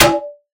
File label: Bell Reso Noise; One Shots; Drums; Ableton Live 12 Lite; Core Library